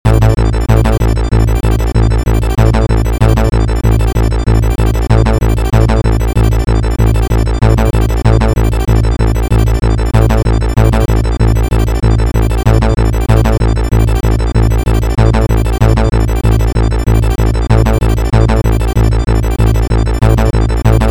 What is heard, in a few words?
Incoming Message; Judge Dredd; SNES; Type E